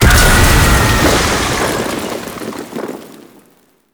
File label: Elevator Explosion; Warframe; Tau; Circles Of Hell